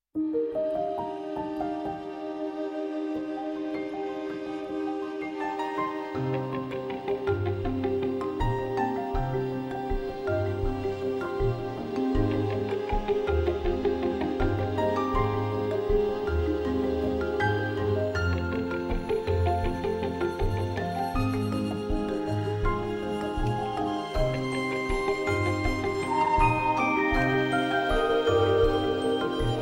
Jungle Orchestra

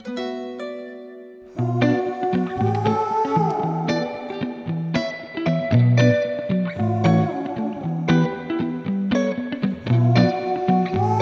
Clean Waves

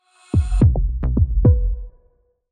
Heartbeat Reinvented Logo; GSK

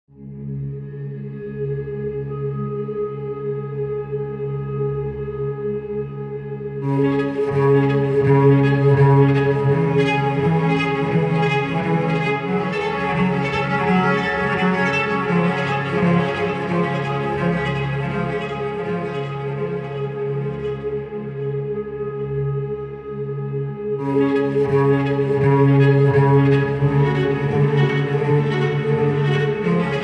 Despair for Solo Cello

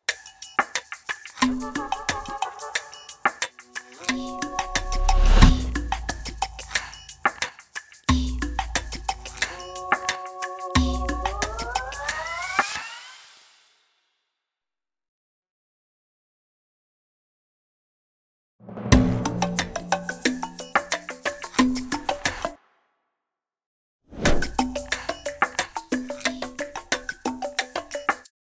Whistle; MPhonic Records

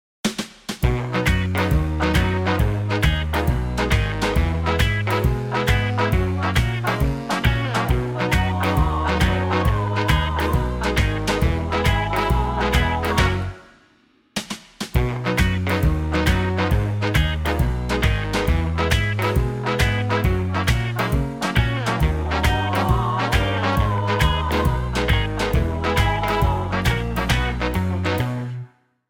We wish you a merry christmas instrumental

